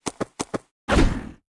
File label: Ambicorn Attack